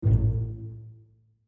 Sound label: 1 note low pizz